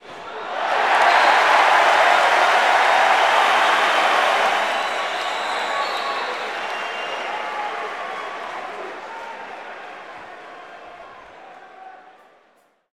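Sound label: Basketball Crowd Cheer; SFX Kit; Crowds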